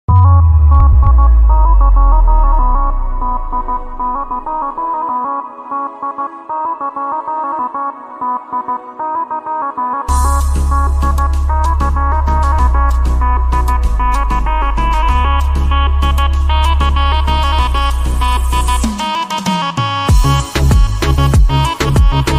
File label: love bgm ringtone tamil flute